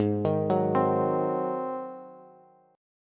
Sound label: G sharp 7th, Bass; G#7; arpeggiated; JGuitar